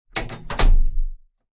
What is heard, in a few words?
Ambience Scatter Machines; Santuario; Josh Journey: Darkness Totems